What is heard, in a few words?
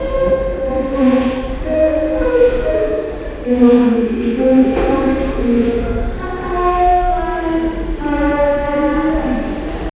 hospital sound